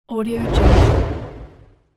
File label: fireball bust